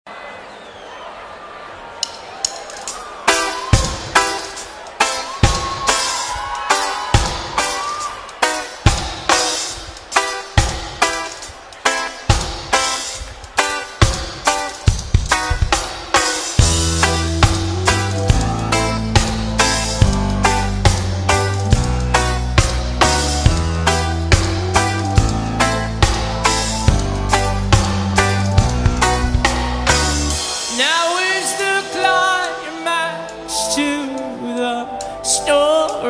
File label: ukulele